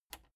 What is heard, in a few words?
plastic button latch loose release enable